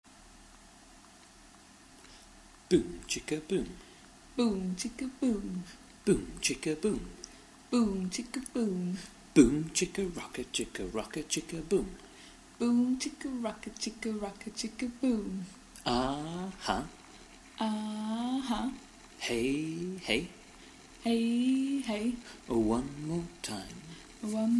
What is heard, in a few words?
Boom chicka boom